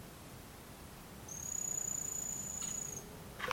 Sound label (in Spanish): Carpinteri, Pica-pau-anão-de-coleira (Picumnus temminckii); Al final esta el contacto